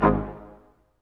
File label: BRS Quintet stac; Roland L-CD702; Quint.Stacc